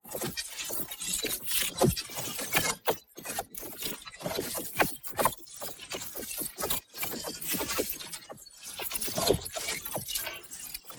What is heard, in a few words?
PARTICLES; Digital Rush; boom library; Matter